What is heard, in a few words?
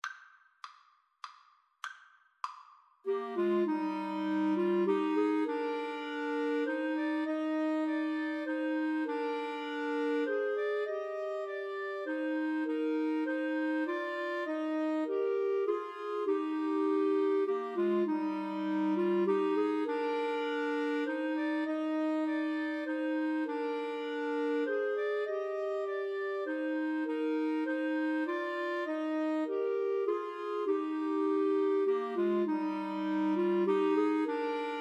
Clarinet Trio; Slow Playalong